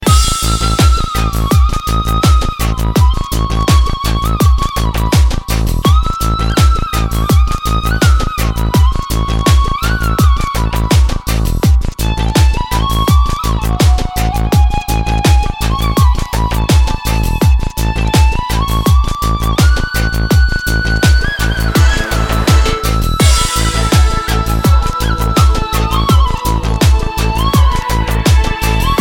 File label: Mannata instrumental